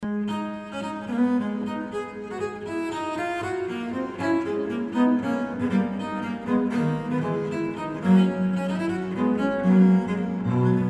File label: gigue a moll; dubuisson